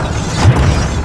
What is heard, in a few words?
mine piston; movers objects